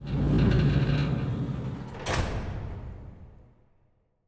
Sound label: Metal Door Closing